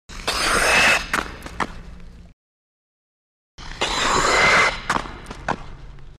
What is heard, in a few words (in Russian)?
tormozhenie na konkah